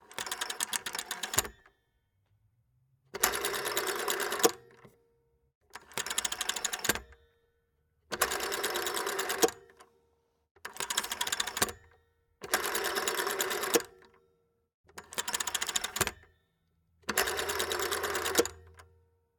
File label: analogue rotary dial telephone dial release 0; tlm103; sonniss gdc